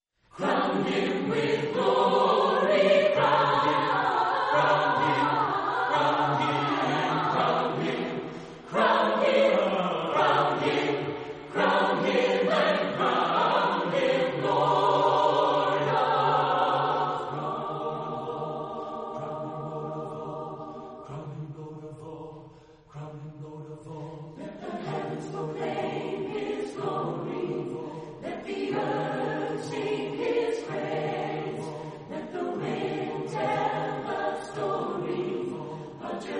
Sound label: Chorale, Voices in Praise acappella